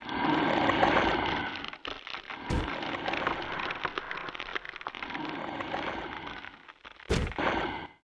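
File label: Skel Knight Idle; Seer; GamigoNA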